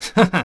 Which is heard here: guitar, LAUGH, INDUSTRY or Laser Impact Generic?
LAUGH